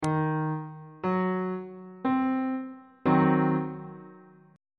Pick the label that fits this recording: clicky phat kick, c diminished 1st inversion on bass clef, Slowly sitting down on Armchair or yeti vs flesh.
c diminished 1st inversion on bass clef